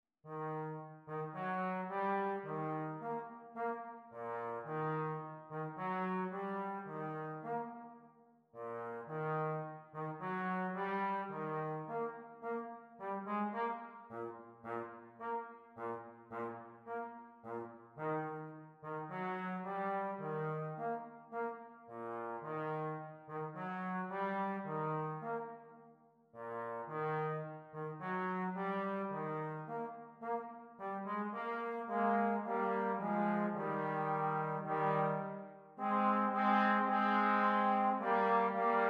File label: Trombones Duet; Tricky Trombones